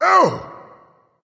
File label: ouch, voice; smite; voicepack; ymir